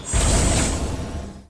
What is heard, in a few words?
stand pick; tf2classic